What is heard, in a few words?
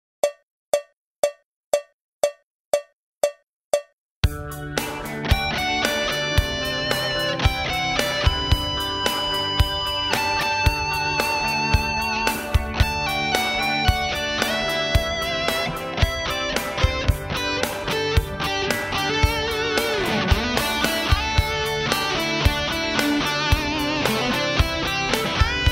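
bridge high gain, goldtop; wcr ironman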